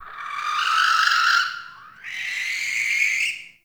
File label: Forest Animal Mid; Ambience